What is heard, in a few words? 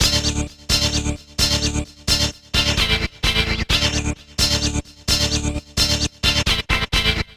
Crazy Seq, Arpeggios & Sequences; Dr. Fink's Funk Factory